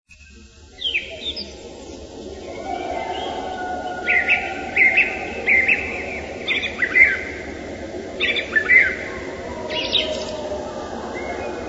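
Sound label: song thrush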